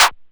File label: Q Clap, DIGITAL KIT